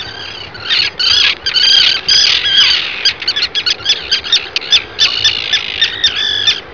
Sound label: common tern